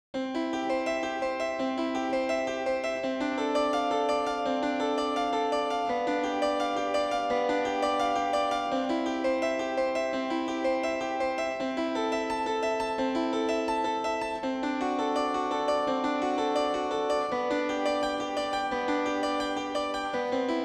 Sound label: Harpsi Piano; pianoteq8; morphing